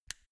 mini switch turn on hard

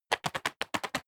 bat wingflap

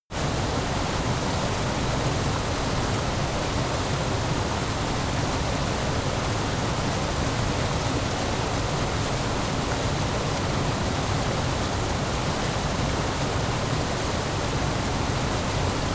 stream

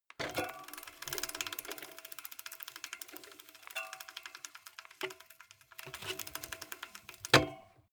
bmx bike freewheel reverse

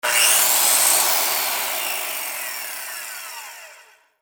electric hand saw

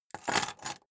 key ring large place table

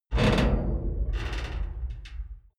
metal tank creak single

